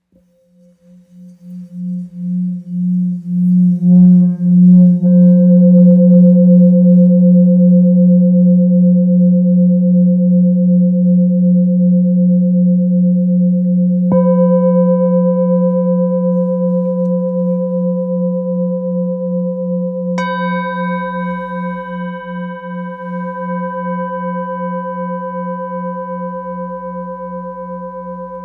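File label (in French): bol full moon fa, bols chantants tibétains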